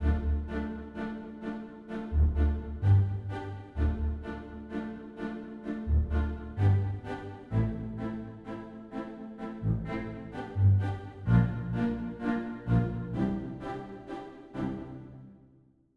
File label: orc Strings